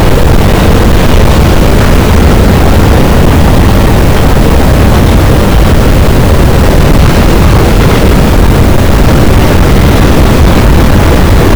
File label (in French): cachalot, gaussian noise; Physeter Macrocephalus